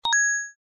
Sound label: coin game